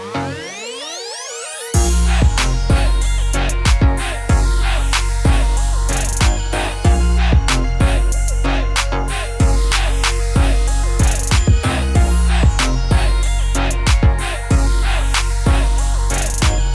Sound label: Dope Club Beat